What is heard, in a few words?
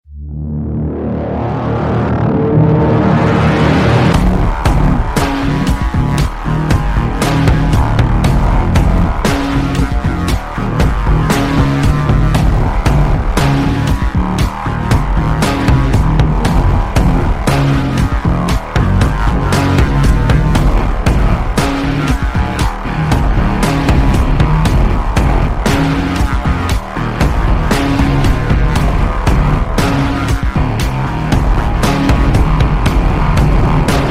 APC Cannon